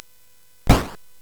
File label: Dark Castle, CSG8580R5